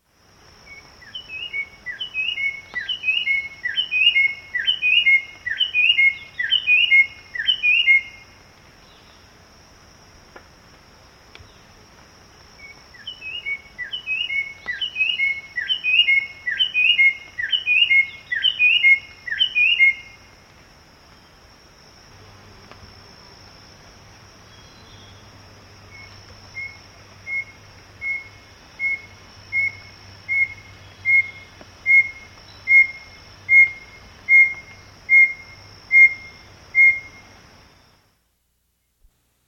Stripe-Breasted Wren; S. America

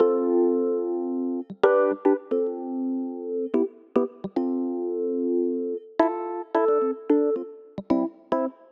Rhodes; Rock; Keys

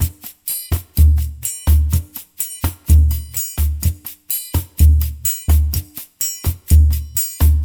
Perc, Percussion Latin

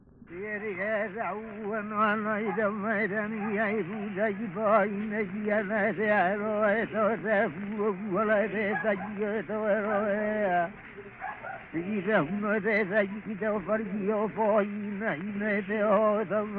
Name chant for the chief, Hawai'i; World Music; Hawaiian; Kupake'e; Na Leo Hawai'i Kahiko - Voices of Old Hawai'i

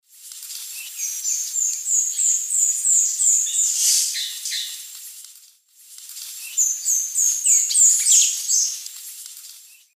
Cranioleuca gutturata, song; S. America; Eastern Lowlands

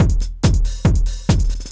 techno 140

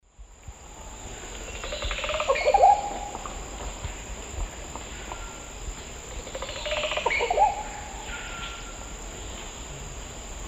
Psarocolius yuracares, song; S. America; Eastern Lowlands

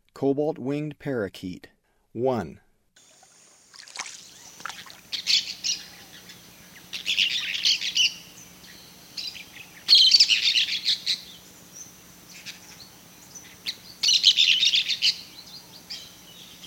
Cobalt-winged Parakeet; S. America; Eastern Lowlands

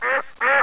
DUCK; animal

